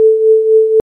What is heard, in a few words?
volume triangle 4hz